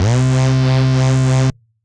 Technotic, Analog Bass; Ableton; Factory Packs; Retro Synths; Analog Bass Vol 1

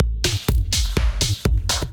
Dubfloor Kit 124 bpm; Ableton; Punch and Tilt

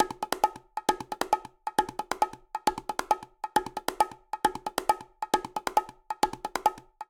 Rhumba Bongo 135bpm, Latin Percussion; Ableton; Factory Packs

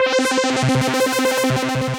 Pathos Mod Glarus, Synth Lead; Ableton; Factory Packs; Build and Drop

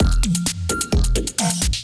Robot Parts Kit groove 130 bpm; MIDI Clips; 122 - 130 bpm; Glitch and Wash; Ableton

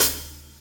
Hat Edge Open Quarter; hihat; drum hits; Ableton; Factory Packs; Drumdrops Recording Hybrid Kit

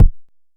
analog quick, Kickbank

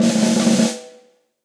Snare Press Cold Tight Room; Snare Articulation; Drum Hits; Session Drums Studio; Standard Hits

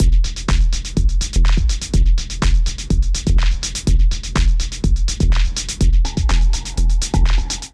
Genome Kit 124bpm; Ableton; Punch and Tilt

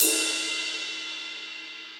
Ride Edge Cold Tight Room; Drums; Drum Hits; Standard Hits; Ableton; Session Drums Studio

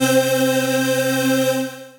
Silver Lining Pad; Synth Pad; Ableton; Factory Packs; Build and Drop

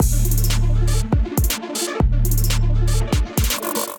Abyss Kit, Drums; Ableton; Beat Tools

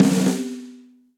Snare Press Ambient Loud Punch; Snare Articulation; Drum Hits; Session Drums Studio; Standard Hits

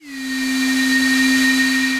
Ambient & Evolving; Ableton; Glitch and Wash

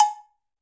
Cowbell A, Latin Percussion; Drum Hits; Ableton; Factory Packs

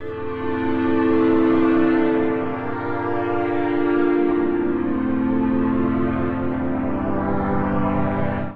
Nostalgia Strings A Minor 112 bpm; Accompaniment; Tonal; MIDI Clips; Drive and Glow; Ableton; Factory Packs

